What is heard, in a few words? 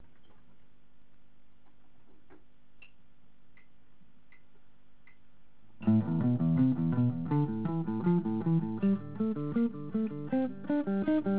alternate picking